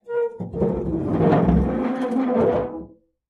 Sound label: metal gate latch; latches & bolts